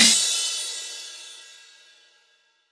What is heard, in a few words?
Crash, Cymbals